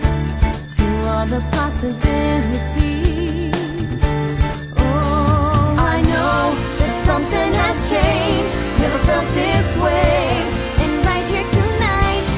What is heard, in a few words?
guide vocal